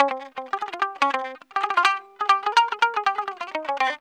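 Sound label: electric bouzouki; EL.BOU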